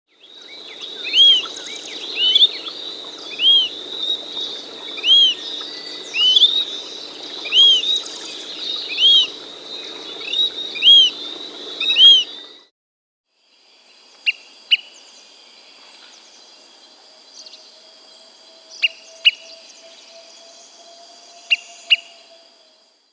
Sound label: Greater Pewee (Contopus Fumigatus), Andean Birds; S. America